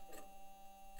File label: mic, mars2020 edlcam